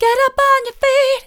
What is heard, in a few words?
get up on your feet soft, vocal